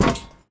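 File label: Grunt Movement Footstep Walk Metal Grate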